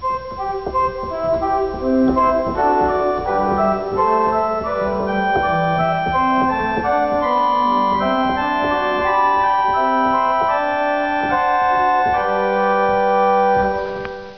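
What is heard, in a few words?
Prinzipal 4, organ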